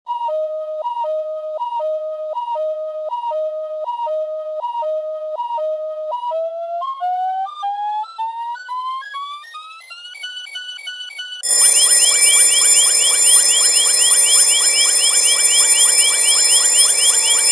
Alarm Ultra

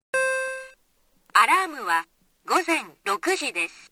Alarm Time

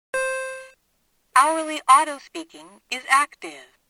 Hourly

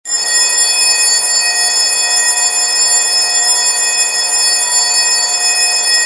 Alarm Super Bell, clock

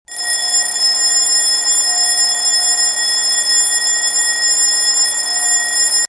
Alarm Min Bell, clock

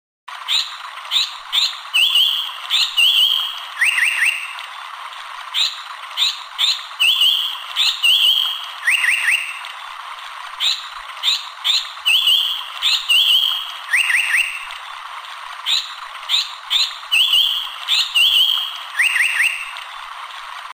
Bird Tweet

